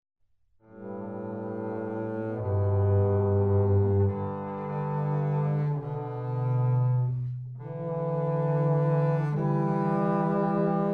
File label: Trombone Duet; Etcetera